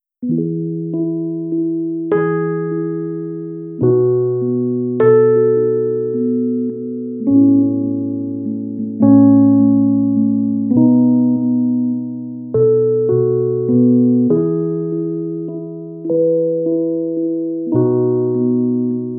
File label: Starling, Solo Instruments